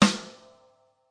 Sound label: Thicky Snare, DrumKit; REAL LIVE KITS; Osama Qureshi DrumKit